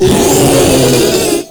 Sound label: Proto Infested Ancient Vocal Attack; Warframe